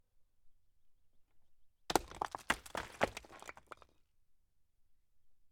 Rock tumble

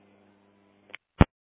Phone Click Off

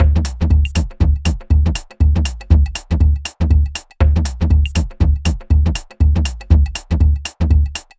Deep Minimal

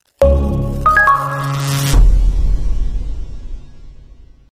Electric Jingle

